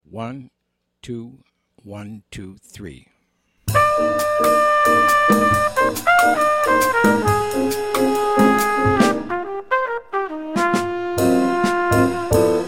minus Bass

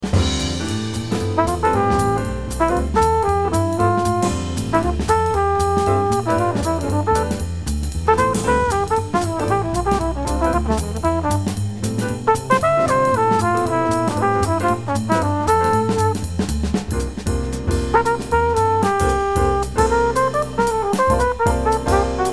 solo

